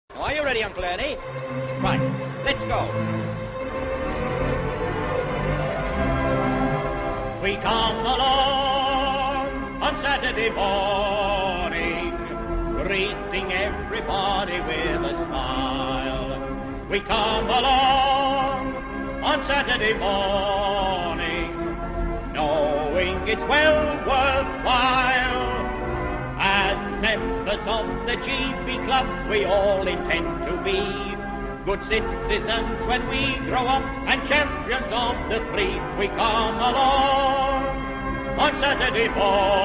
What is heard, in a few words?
song